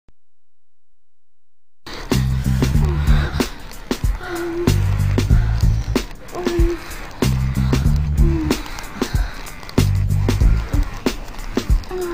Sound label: orgasm sound, som de orgasmo feminino